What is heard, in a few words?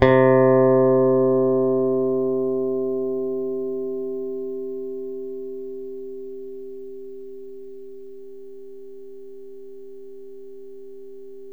PICK BASS, Electric Basses; PlatinumPhattCD2; E-MU Producer Series Vol. 8 – Platinum Phatt